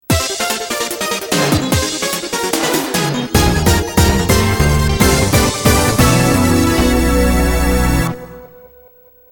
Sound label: victory; Air Force Delta